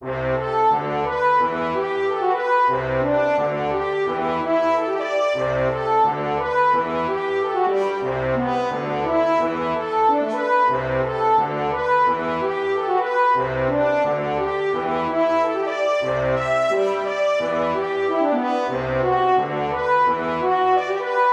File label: Orchestral Brass Energetic C, Cinematic Trailer Loops